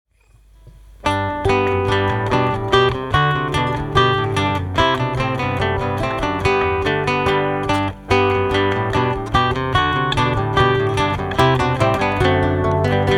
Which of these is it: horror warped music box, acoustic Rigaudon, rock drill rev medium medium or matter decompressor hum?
acoustic Rigaudon